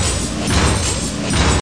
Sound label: status boss Move